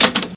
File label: DOOR OPE